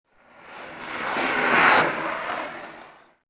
car drive by

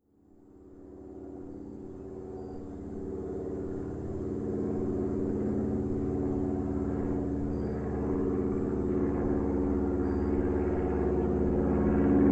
small airplane long flyby; Mike Koenig

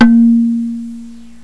gong

